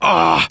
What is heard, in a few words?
Wound Crit; Prince Valanar